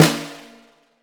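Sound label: Snare Acoustic; Polyend; Simulacrum Sounds; 1 Shots; Acoustic and Electric; Drums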